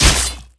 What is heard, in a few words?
metal medium slice flesh